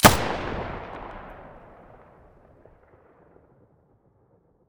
muzzle; weapons; pp1901; vityaz

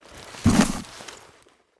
pistol mask use; STALKER; IWP; item usage

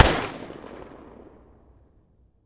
muzzle protecta mono distant, weapons

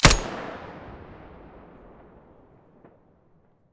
muzzle stereo, weapons; fort

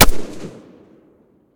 usp45 muzzle mono distant

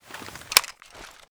gauss off; weapons

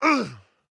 pain; STALKER; IWP

